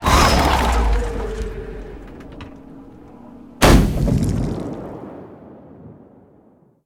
fall in; seaworld; Blind Drive

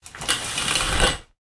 Shower Curtain Close, Bathroom; 1001 Sound Effects

